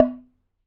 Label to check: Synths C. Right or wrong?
Wrong